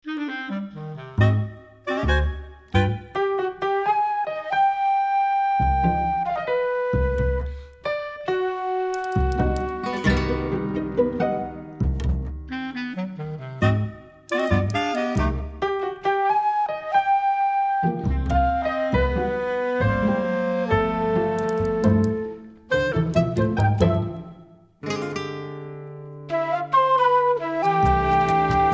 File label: crime tension